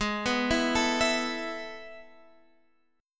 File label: A Major 7th Suspended 2nd